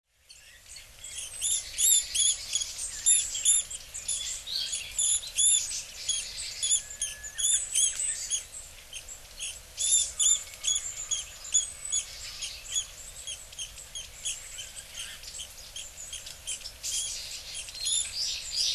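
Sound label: Japanese Pygmy Woodpecker; koe; kogera; hyogo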